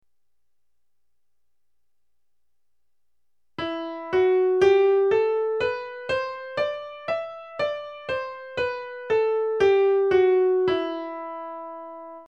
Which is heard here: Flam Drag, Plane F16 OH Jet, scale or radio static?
scale